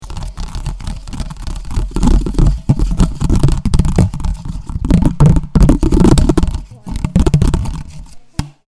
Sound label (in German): Plastikflaschen ratschen